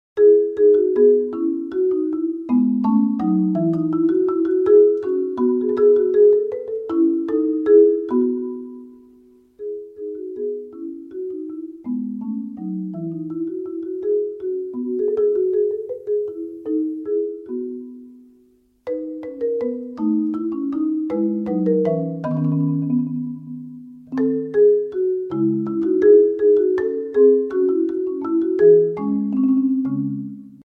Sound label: Bach Partita no.3